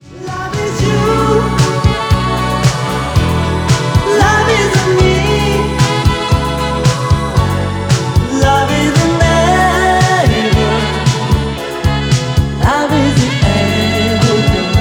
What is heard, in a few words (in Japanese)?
歌謡曲男性ポップス